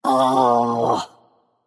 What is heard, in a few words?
"ugh"; smite; janus